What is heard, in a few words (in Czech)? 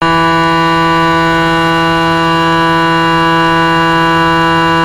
trompeta 8, englerovy varhany